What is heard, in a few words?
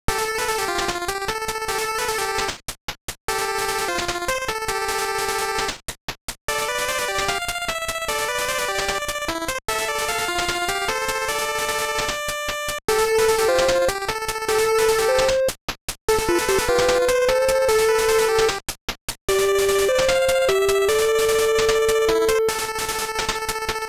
Race; Turbotime; Famicom